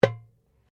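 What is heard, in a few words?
Drum Darabuka Strike piano, Egyptian Darabuka Drum; Drums; Musical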